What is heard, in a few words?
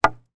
impact wood pole hit wood